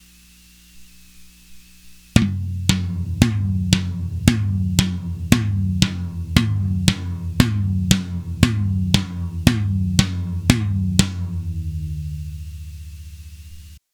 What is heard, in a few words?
tom sample with eq comp, drums recorded with contact mics